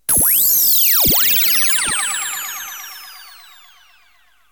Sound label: notify error; openmoko-sound-theme-standard